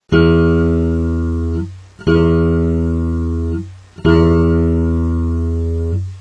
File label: Bass Guitar E String